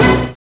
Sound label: MIN CHORD, CMI